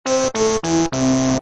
failure

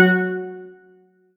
steel drum c4

